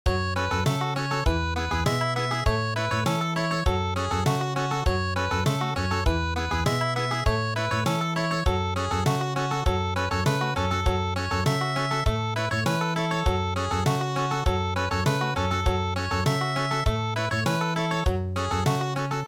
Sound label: Country, traditional